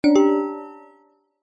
multimedia notification mallet negative with slightly harsh resonating tone; zapsplat